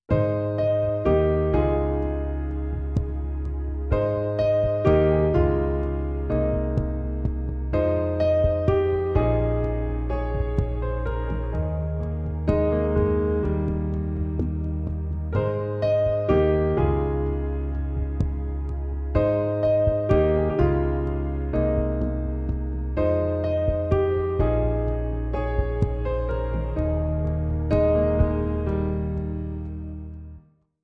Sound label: Piano Theme